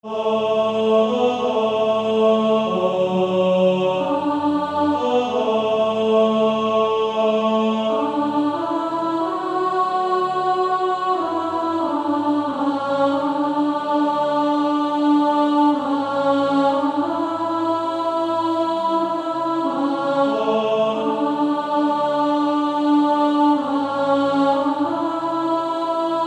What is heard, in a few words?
Male Choir